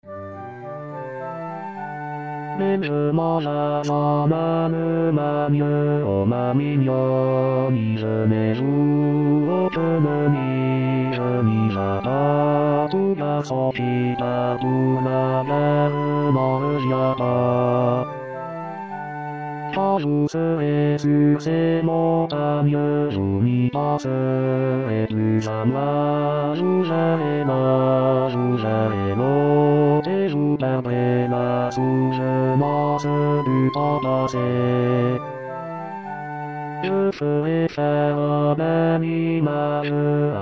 belle je m'en vas hommes; 2026 saint jean

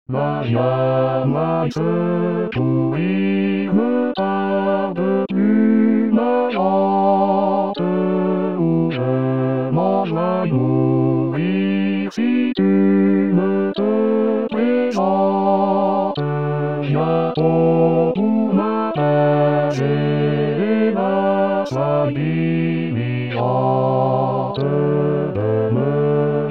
basse; rimailleurs

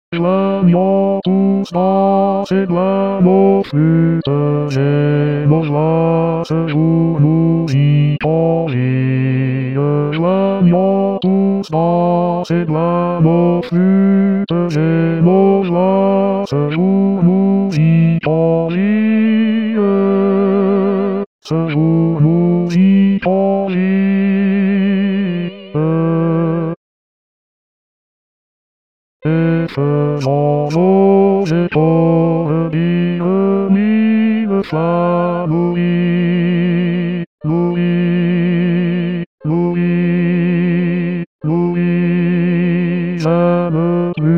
basse; joignons flutes do

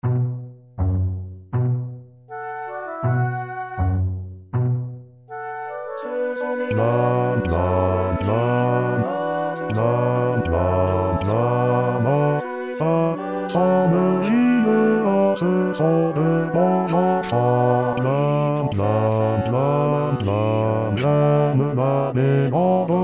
senneville basse; 2026 saint jean

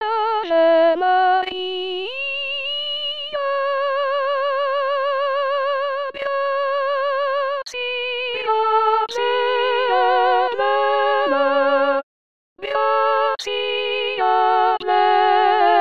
avemaria tutti; victoria; 2016 vox maria

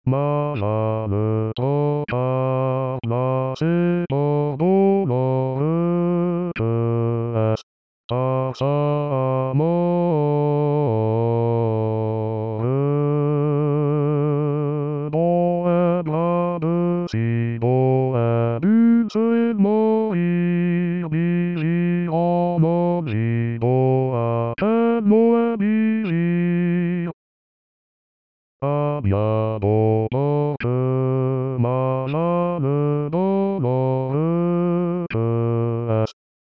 basse; mas vale trocar; rimailleurs

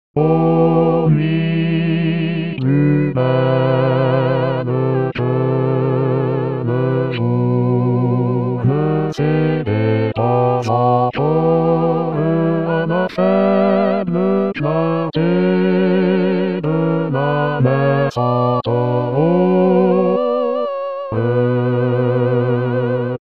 o nuit plus belle basse

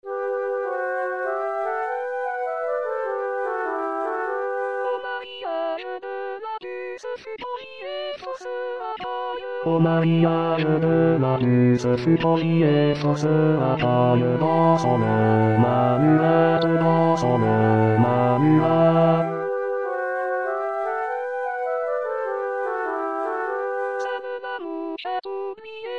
hommes; puce; 2016 st jean

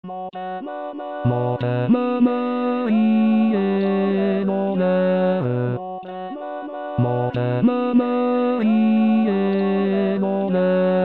basse; deja mal mariee; 2026 saint jean

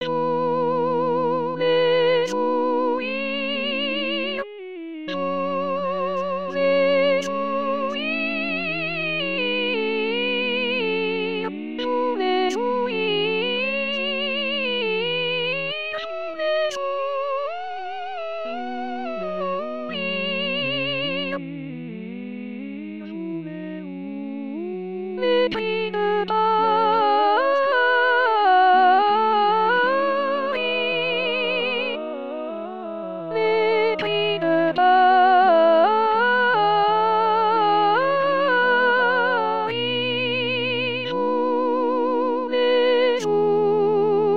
cris soprano; 2017 st jean

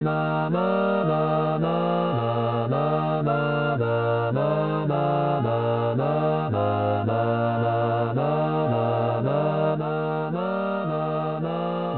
bourgeois gentilhom basse; 2020 st jean

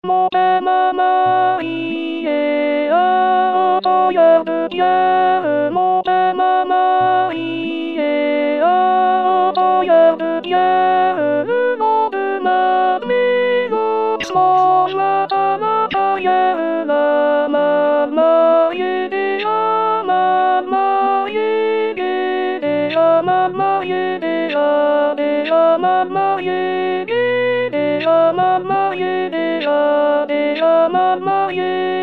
deja mal mariee alto; 2026 saint jean

